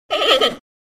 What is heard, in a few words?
Panda Bleat Single